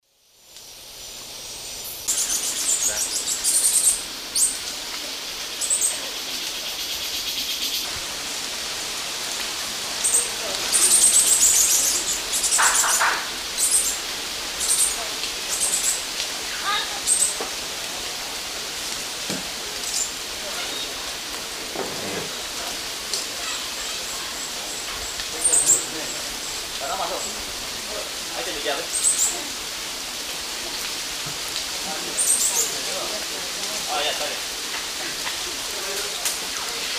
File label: Glaucis hirsuta, calls; S. America; Eastern Lowlands